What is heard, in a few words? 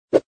pan start; puzzleweave